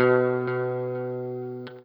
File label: NOODLE LONG, Guitar & Bass